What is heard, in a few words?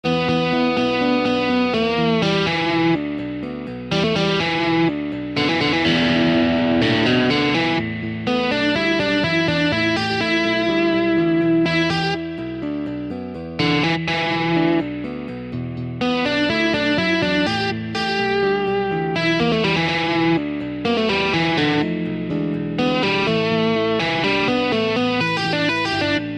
Easy Blues Solo in E